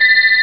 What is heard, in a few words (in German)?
pfeife; Amiga Soundtracker Sample Packs